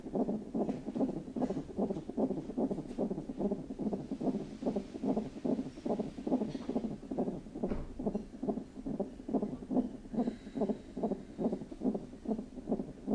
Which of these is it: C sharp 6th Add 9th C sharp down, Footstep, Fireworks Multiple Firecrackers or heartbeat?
heartbeat